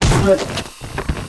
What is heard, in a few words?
STONEBLOCK WAR DAMAGE